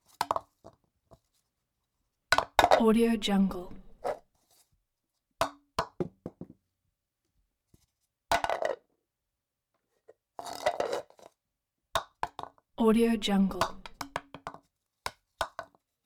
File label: coconut movement on concrete short drops